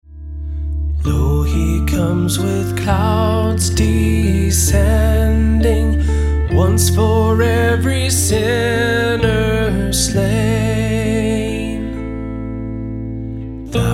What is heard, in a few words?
lo he comes with clouds descending; emmanuel lux